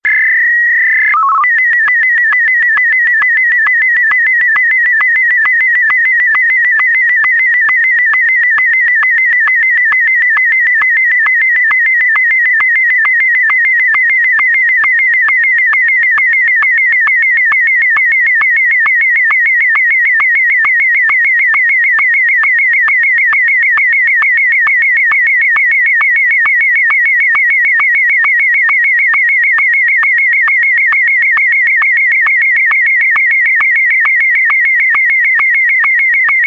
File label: sstv martin m1